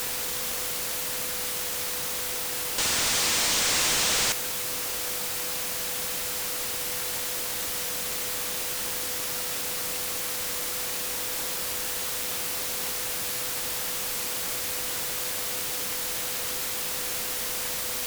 9k6 telemetry; BYZ; JA0CAW

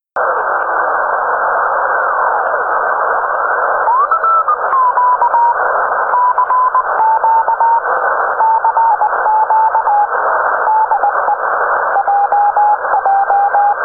CW Mode; ChubuSat-3

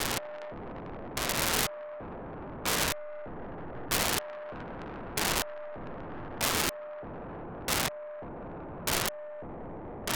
437 MHz 1k2 FSK AX100 Mode 5 beacon, satellite; ION-MK01 437MHz515; On November 23rd 2022 at 10:17 UTC Daniel DL7NDR received on 437.515 MHz the 1200bd FSK be

